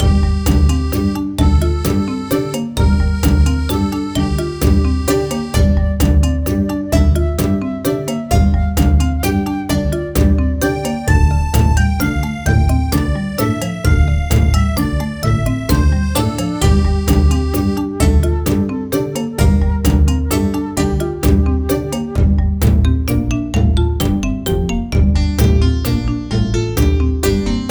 Crete hub music

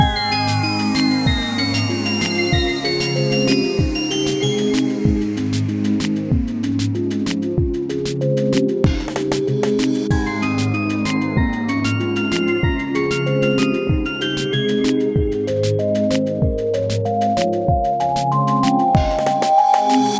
Kids F 95bpm

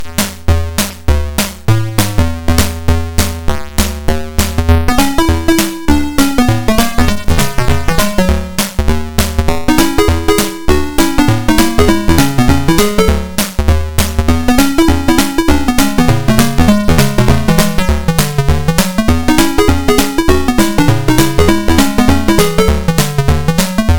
High Score; atari st

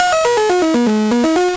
Beat

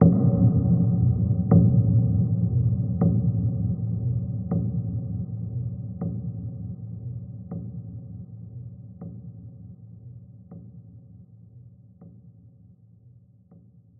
Percu Intro

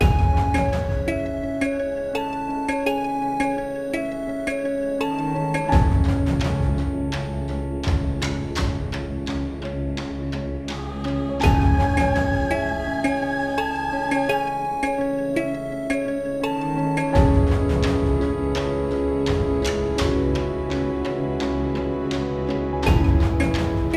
Caves Loop

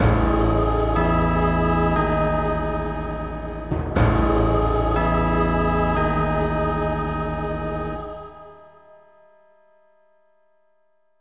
You Lose; magic the gathering; dreamcast

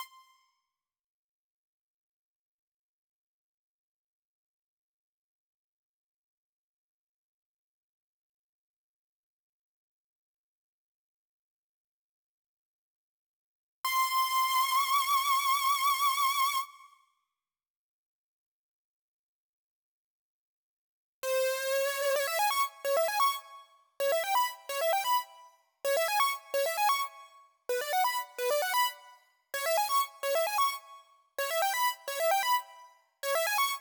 Doom Mix Stem Layer; Asteroids

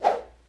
Catch A Bug

